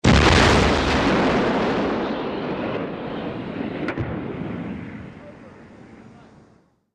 Artill Tank Gun Fire, modern artillery exterior

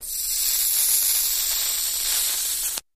DC Arc Across Knife, arcs & sparks

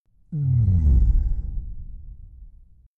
Low Vocal Power Down, servos

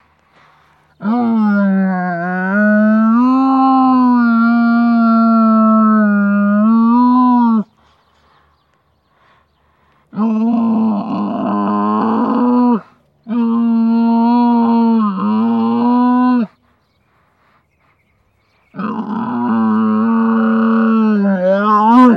Camel Frustrated Con; camels

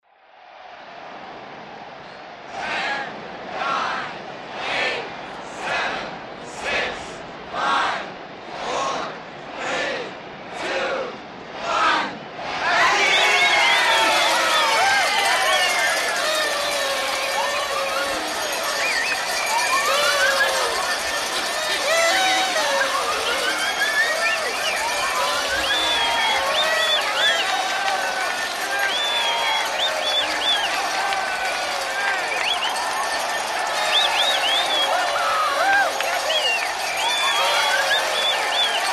New Years Large Crowd, large

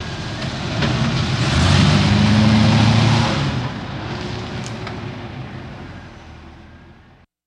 Cadillac In Gear And Back Up

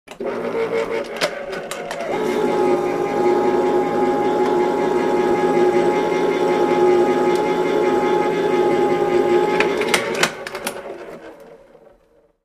Electric Can Opener; utensils

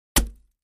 Axe Paneling 1 Side, axe hits

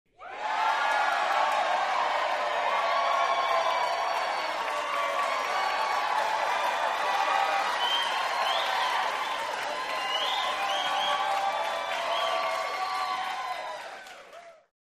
Crowd 100 W Whistles; medium int

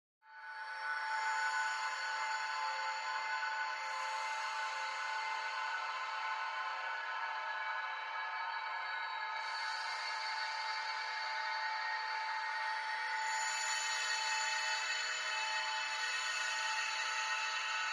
Amb Crystal Palace; ambience

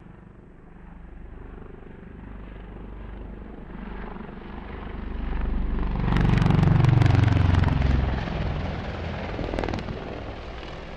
Apache Turns Right, AH-64 Apache

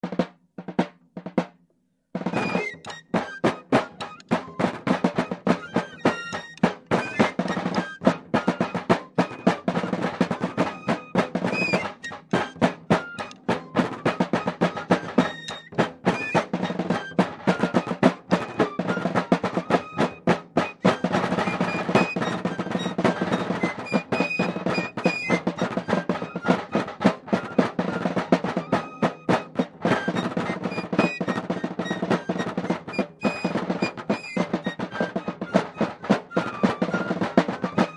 Fife Drum Band; Civil War Era Tune Played By Traditional Flute / Drum Ense

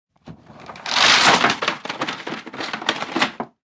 Doors structures Win, blinds

